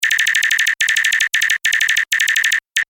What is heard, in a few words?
Beeps Data Transmission Technology; Scifi Science Fiction Multimedia